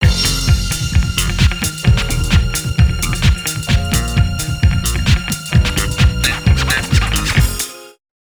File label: Pre-Fight; Disco Kid